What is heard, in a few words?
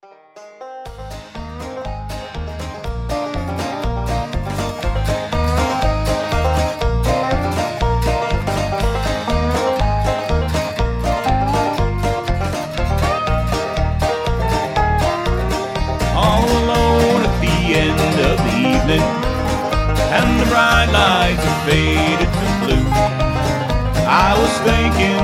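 Instrumental with background vocals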